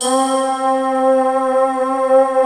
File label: SYNTH PAD